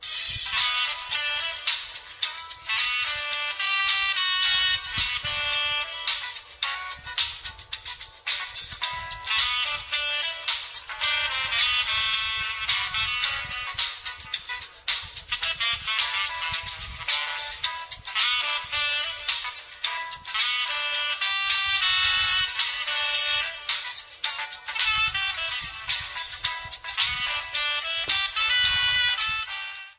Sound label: ringtone